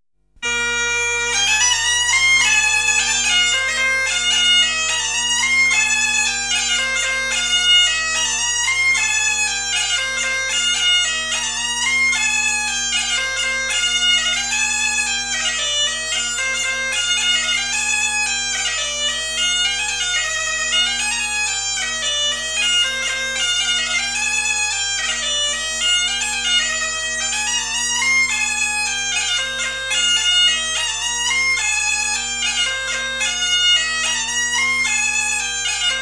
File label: Bourree; Bourree d'Fontfrin